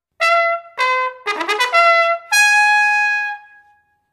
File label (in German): Marsch Marsch, Kavallerie